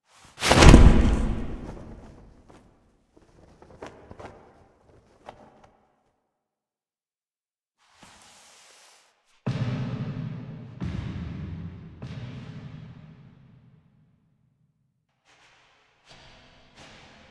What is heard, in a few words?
Foley; Warframe; The New War; Finale